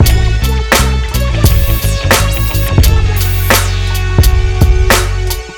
Loop

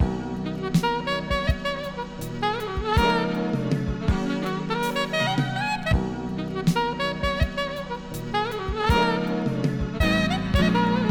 organic jazz sample 82bpm

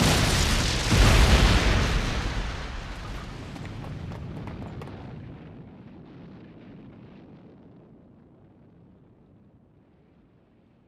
Busts Down the Doors; Wrath

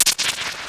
FX

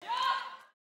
CHANT YAHH, Vox

